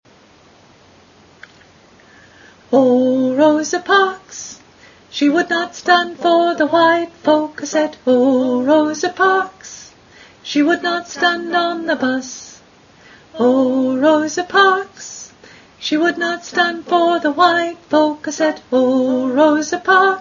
alto

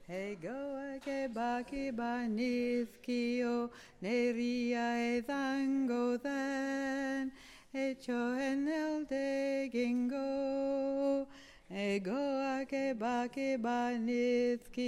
alto

